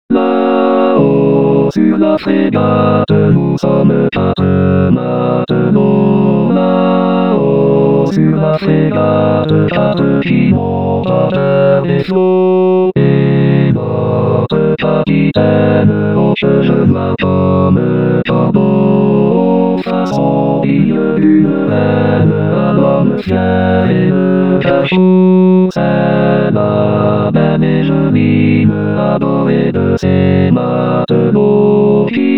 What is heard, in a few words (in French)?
Tutti; La Fregate